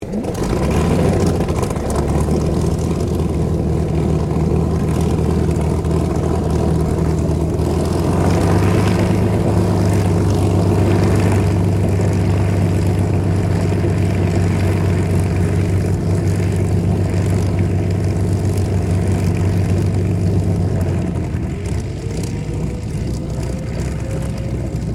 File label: AIRCRAFT SUPERMARINE SPITFIRE MK-VIII STARTUP STEREO; Airplanes; Sound Effects:Vehicles